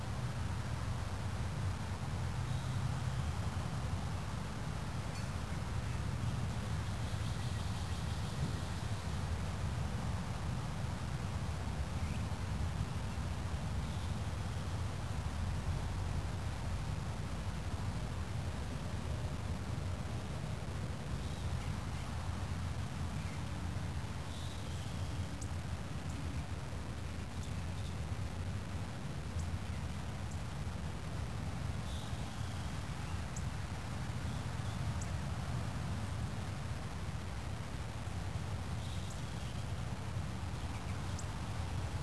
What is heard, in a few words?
suburb ambient